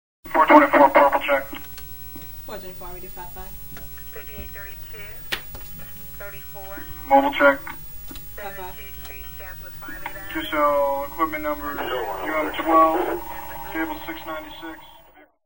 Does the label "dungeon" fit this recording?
No